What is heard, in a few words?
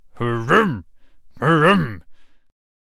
Miner Attack Vocals